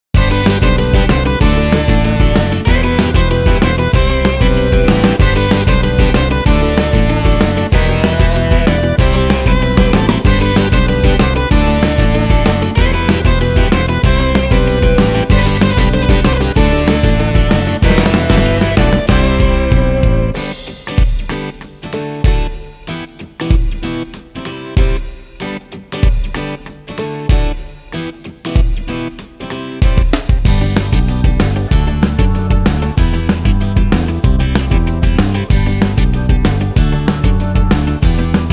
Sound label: Fast Rock